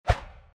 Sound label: sword; Keep Out! A Dungeon Adventure for the Brave